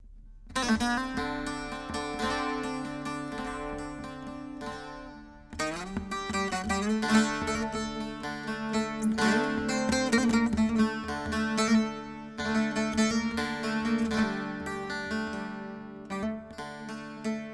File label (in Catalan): solo saz